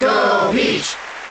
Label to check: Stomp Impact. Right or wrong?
Wrong